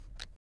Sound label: item rollover